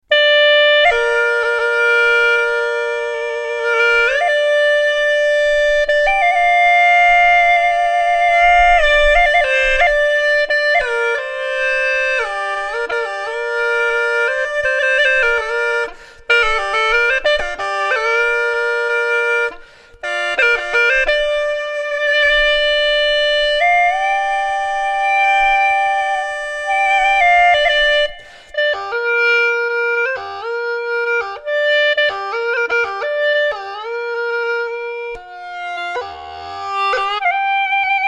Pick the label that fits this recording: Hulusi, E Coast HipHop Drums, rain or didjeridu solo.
Hulusi